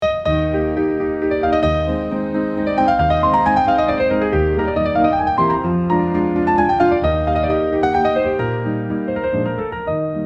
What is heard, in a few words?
classic piano solo